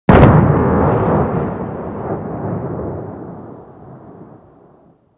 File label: Projectile Sounds; Red Orchestra 41-45